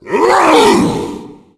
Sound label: knife hit